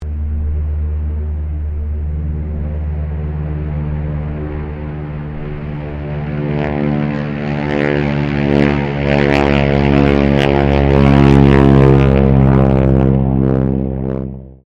AIRCRAFT AT-6D HARVARD MK IIB TAKE OFF, Training aircraft; 1942; Airplanes